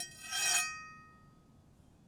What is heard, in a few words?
Big Knife Scrape, kitchen knives; Micro Pack